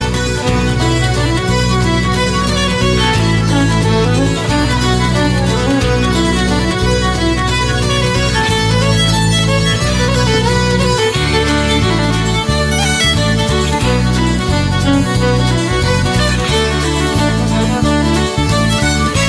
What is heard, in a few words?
instrumental